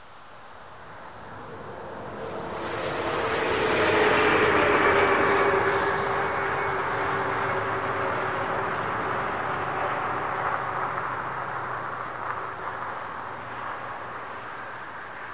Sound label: car